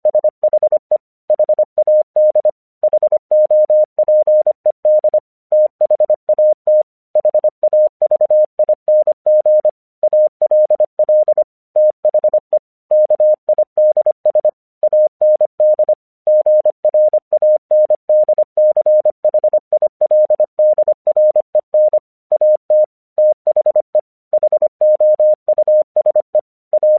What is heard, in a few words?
English 25wpm